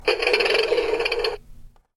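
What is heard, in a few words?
Toy Plane Ignition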